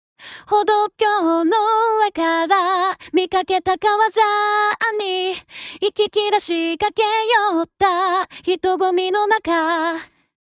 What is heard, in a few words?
vocal on